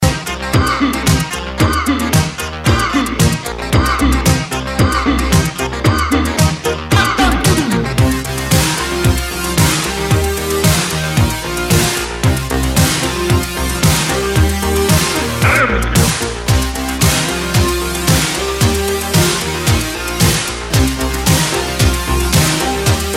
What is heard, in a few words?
Disco Band